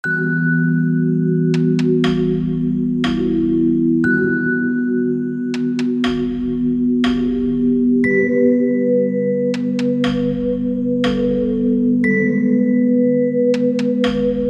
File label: eavesdropping